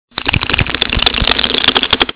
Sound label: rattlebox